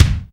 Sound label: XV Kick, Roland XV-5080